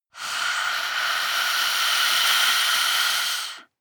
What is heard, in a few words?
breath female eerie hiss